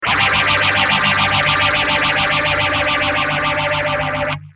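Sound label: guitar, Mutron phasor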